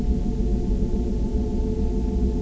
alien noise

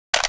mag bounce, weapons

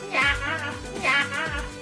cat

